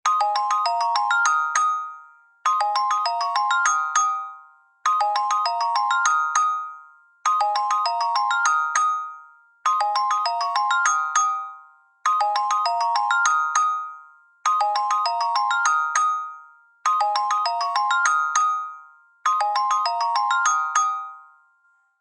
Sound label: Simple Motive, alarms